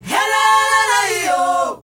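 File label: "HEHLELEYO", World Voices South African; ILIO; Vocal Planet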